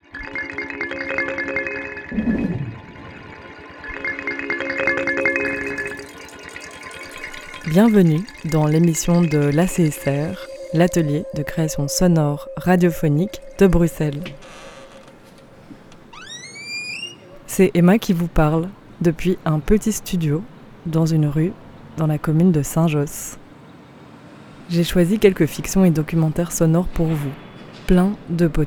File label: Radio INTRO JINGLE; Radio Haren; des sons pour les detenus